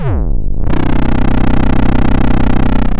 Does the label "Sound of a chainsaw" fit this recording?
Yes